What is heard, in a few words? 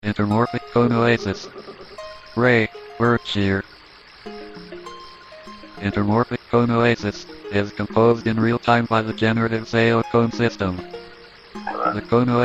jingles; SD SOUND